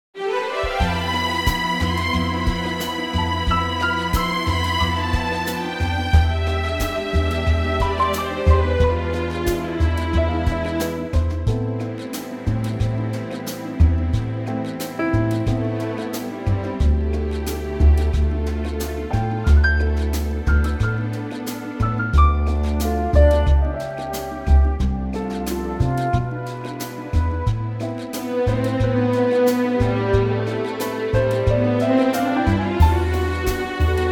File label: Am orchestra